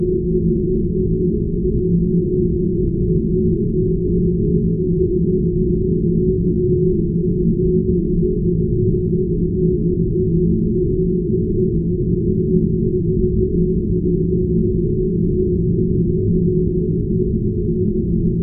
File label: generate an audio file th, silence